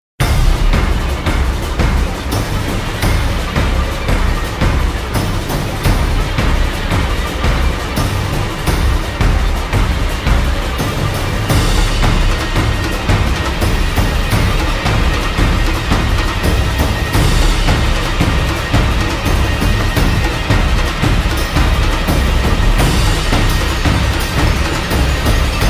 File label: Percussion